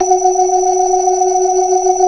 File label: BLOOD OCT R, ORGAN